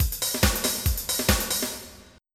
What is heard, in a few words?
house musik; FL Studio